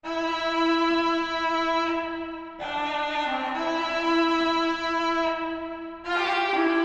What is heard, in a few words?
Various Melody Loop - 140 BPM A Min Pipe; Melodies; Loop Stems; Cymatics; Oracle Sample Pack; Oracle Various Melody Loop 12